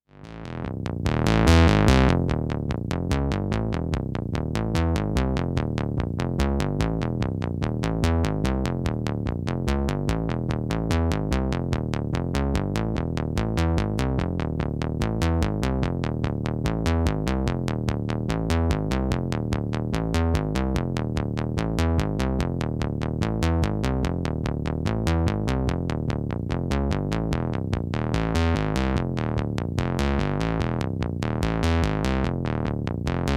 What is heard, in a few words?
ARP 1601 Axxe